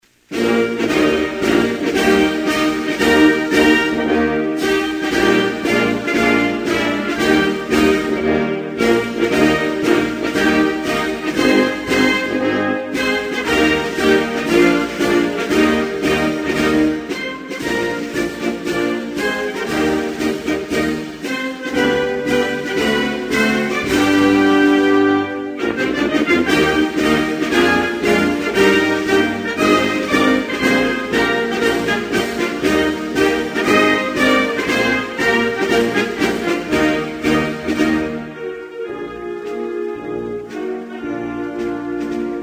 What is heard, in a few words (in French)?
Costa Rica, Hymnes nationaux